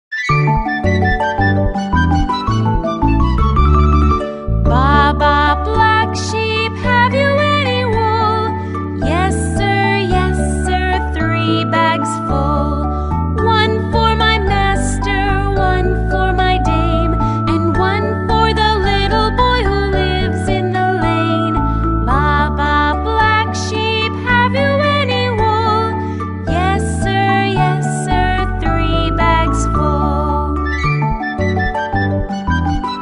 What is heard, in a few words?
Baa Baa Black Sheep nursery rhymes children songs with lyrics